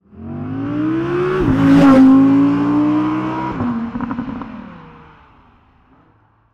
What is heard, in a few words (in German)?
Audi R8 Coupe Vorbeifahrt; r8 v10 quattro; audi sport